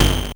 Bent Tom

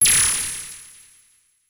CABL TEN, CABL TENS; Total Control - Action Cutz

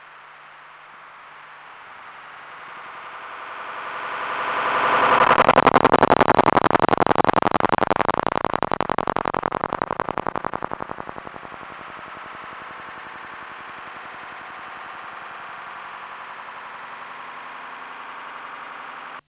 twister, fx & sweeps; EZRollers

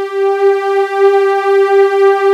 hybrids, JD8 pads

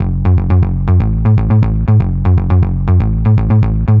Trigger Bass, Bass Foundations; Sonic Foundry (Sony Creative Software); The Electronic Music Manuscript Collection

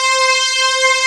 P5 STRINGS; E-MU Formula 4000 Series Vol. 3; Analog Odyssey; VK Instruments

